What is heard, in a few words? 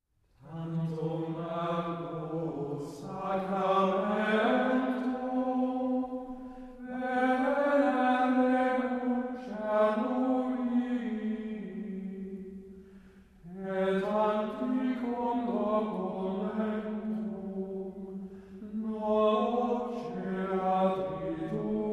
Tantum ergo Choral